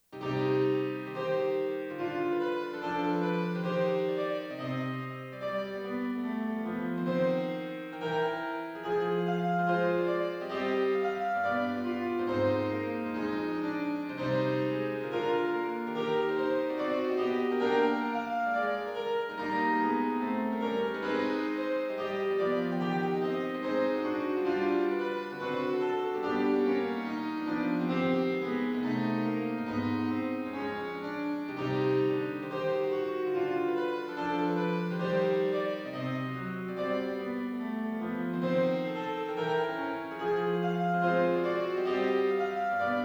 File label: Romantic Piano setting